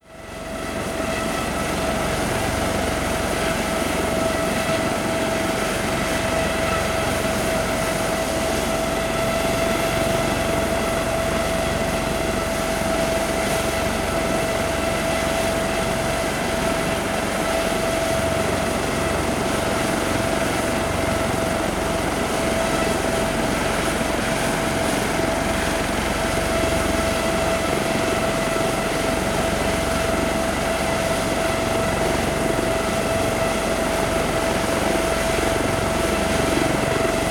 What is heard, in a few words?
Sea Helicopter Idle; Sony Pictures Sound Effects Series; Vehicles